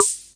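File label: rim cymbal, Ace Tone Rhythm Ace FR-1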